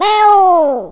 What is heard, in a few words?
meuu; TomCat